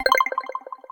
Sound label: chain